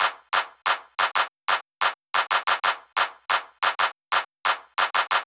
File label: Intro Clap; これがイントロ前半4小節のリズムを簡易的にしたものです。